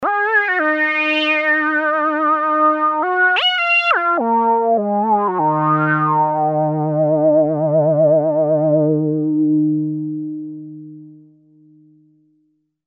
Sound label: slippery lead